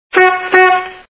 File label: honk honk, TOOT; Rosegarden